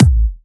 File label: Kicks; Vengeance Samples